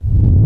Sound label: Kick Reverse; One Shots; Drums